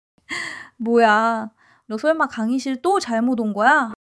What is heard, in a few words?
laughter; monologue